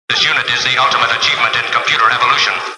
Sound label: computer; tos ultimate computer